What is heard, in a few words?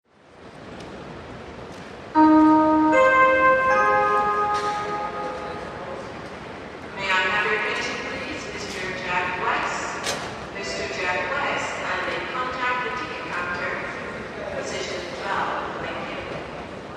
PA Chimes Announces, airport